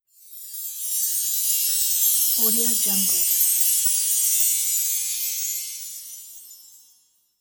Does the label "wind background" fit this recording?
No